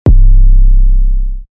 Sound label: 808 C; Syba; Syba sample pack